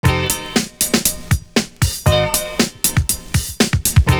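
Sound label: WITH 2 CHORD, 119 bpm; Univers Sons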